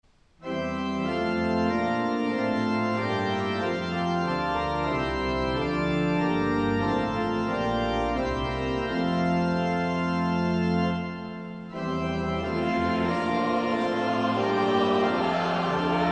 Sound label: Hymn, O little town of Bethlehem; Forest Green